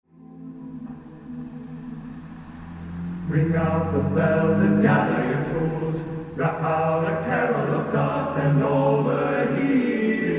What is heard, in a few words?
TTBB; Alleluia into the light; a miners carol